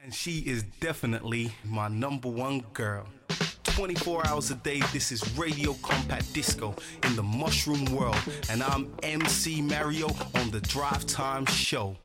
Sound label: Funk, Radio Compact Disco